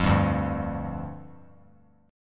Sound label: D-Minor Double Flat 5th, D, Bass Standard; Dmbb5; strummed; JGuitar